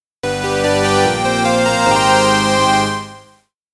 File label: Reward [Jingle Event Clear]; Etrian Odyssey